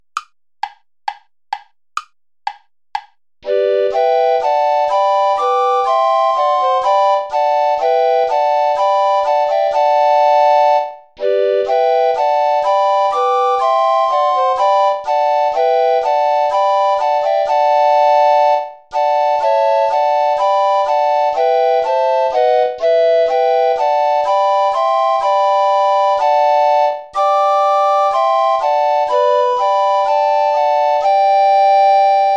play along without bass recorder; schutz heinrich wohl denen die wandeln

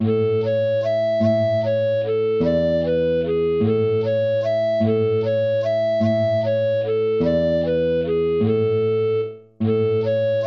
waltz; Carulli Ferdinando

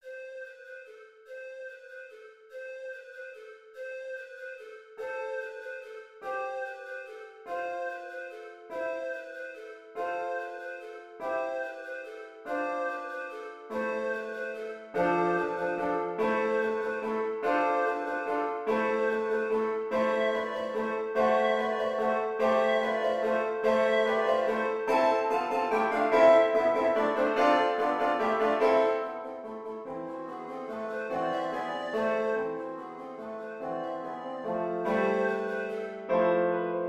carols of the bells; leontovich mykola

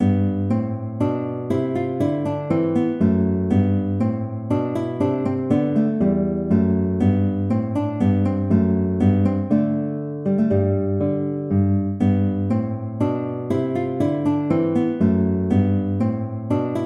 baroque minuet major

